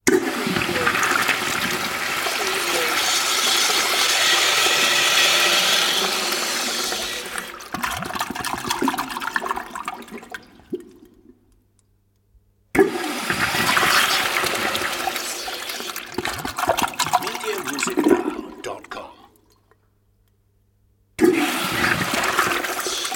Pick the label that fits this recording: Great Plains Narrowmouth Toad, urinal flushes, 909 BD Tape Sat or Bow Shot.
urinal flushes